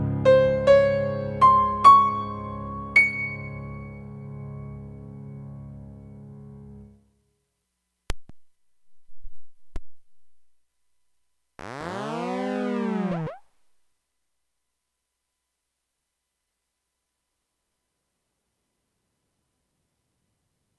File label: sad synth